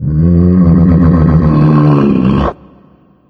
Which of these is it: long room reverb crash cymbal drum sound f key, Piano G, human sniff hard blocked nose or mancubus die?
mancubus die